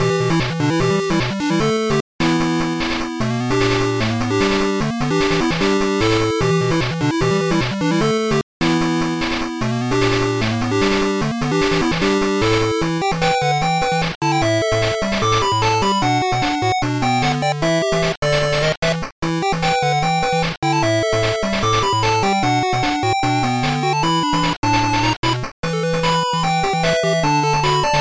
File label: Battle BGM; Shinseiki Ultraman Densetsu Pico gamerip 2002